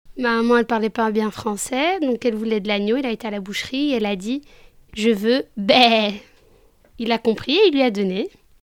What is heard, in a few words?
beee